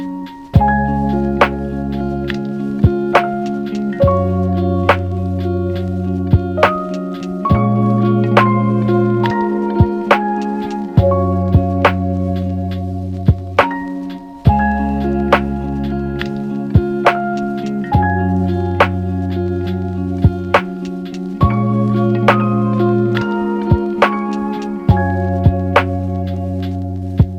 Samsung Galaxy A12 Ringtone; (India)

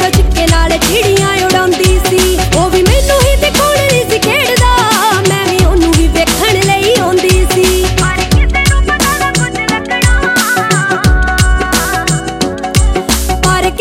Bhangra Gidha Ringtone, Punjabi

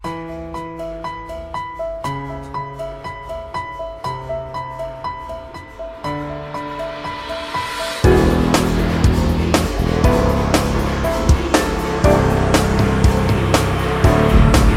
Samsung Galaxy Tab A8.4 Ringtone

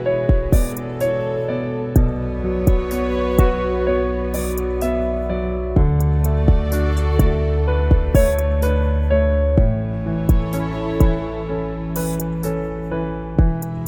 Oppo Reno 8 Ringtone; (China)

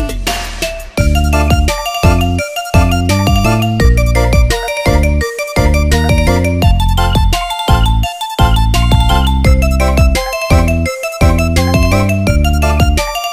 IPhone ios 14.2 Stock Default Ringtone

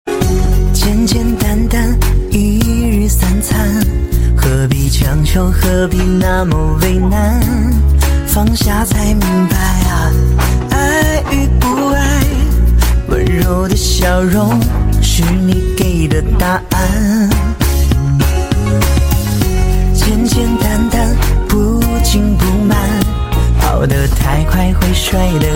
Chinese Song Ringtone

